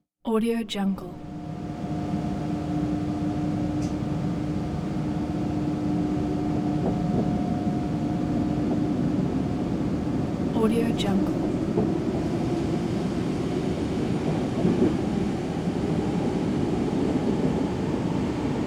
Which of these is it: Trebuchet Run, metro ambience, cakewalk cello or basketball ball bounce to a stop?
metro ambience